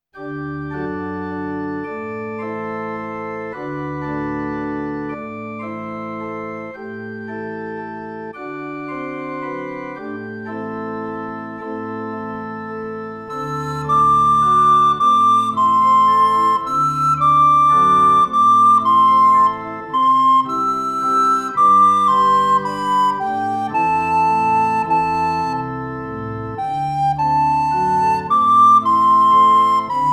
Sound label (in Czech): flétna + varhany; Hajej, nynej; Flautoškola 2 – METODICKÉ NAHRÁVKY a DOPROVODY